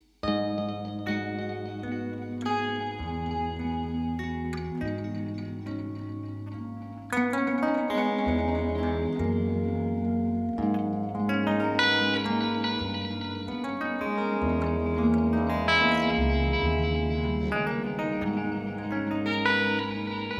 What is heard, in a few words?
reverb salsa intro